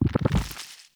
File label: Nunchuck Pulse; Warframe; Infested